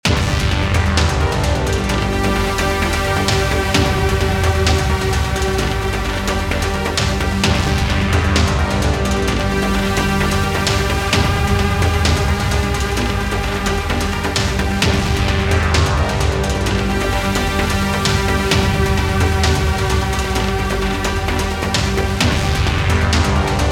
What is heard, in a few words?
Boss Theme Music